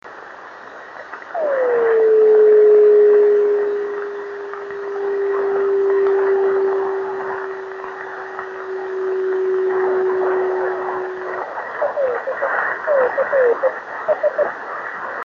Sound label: 27170KHz